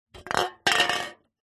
foley drink can empty drop or knock over on concrete; zapsplat